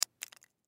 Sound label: Potato Chip Drop Junk Food; Household; Blastwave; Foley